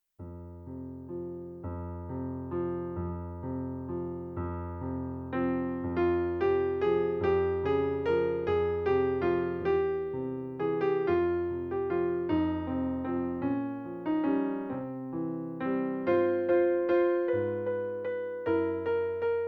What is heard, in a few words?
Backing